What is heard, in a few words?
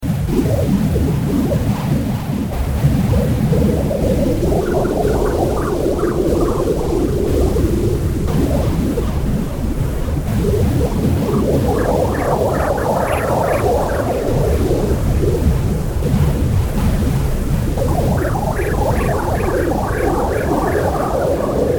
Swooshing sound of aliens in flight; Flying Aliens